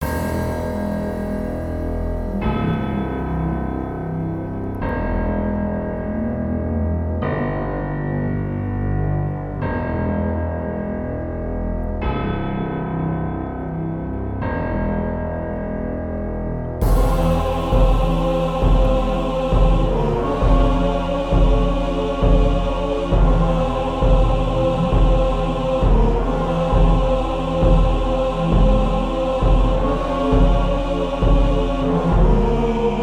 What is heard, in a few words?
Underwater; Tales Across Time